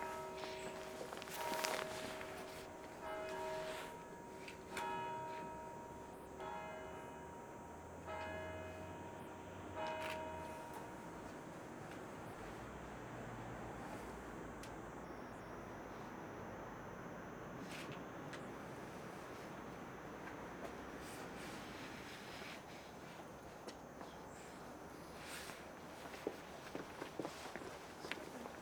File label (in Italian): suoni via del port; borgo sacco